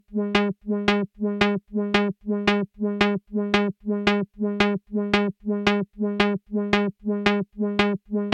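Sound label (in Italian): esempio modulare oscillatore più filtro; modulare parte 2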